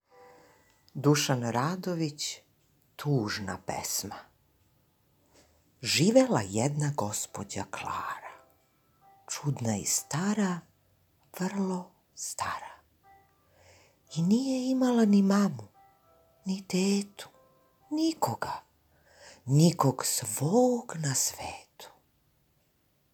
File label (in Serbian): Tuzna Pesma